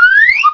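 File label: whistle up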